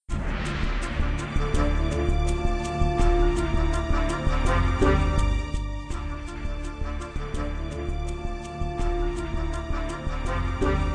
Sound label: Music Idle; Zak Storm Super Pirate; mobile